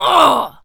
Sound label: pain, Zombies; zps; Z Waitress